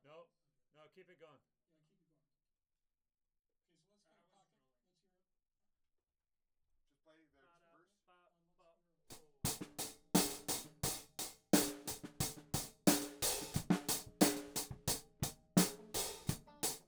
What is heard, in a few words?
Hat